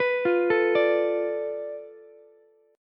F 6th Flat 5th F Ukulele; arpeggiated; chord; JGuitar

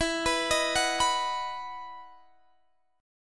E Major 7th Suspended 2nd E; x,19,21,20,19,19; arpeggiated

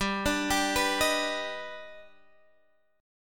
D sharp Augmented Major 7th G, chord

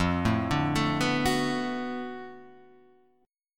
B Minor 7th F, chord; Bm7/F; arpeggiated; JGuitar

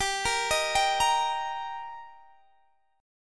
G Minor Sharp 5th G, Guitar, DADGAD; chordshape; down

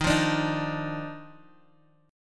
D sharp Major 7th Suspended 2nd, Bass Standard; strummed; JGuitar

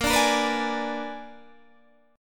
Bb 7th Flat 9th Bb down Guitar Open D

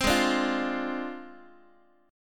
Ab 6th Flat 5th Ab, Guitar; x,x,3,6,6,4; strummed

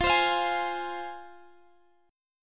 C Suspended 4th Sharp 5th F

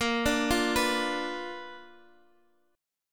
B Minor Major 7th Flat 5th, chord

